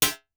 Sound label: CLAP, Vermona Mono15 Drums; blend with snare; Stereo layers